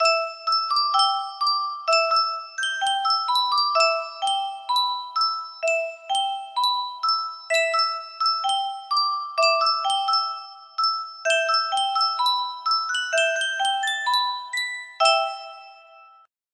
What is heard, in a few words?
yunsheng music box, melody